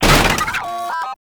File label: Jammer Destroy; Gameplay; Warframe; Nineteeen Ninety Nine; PvPvE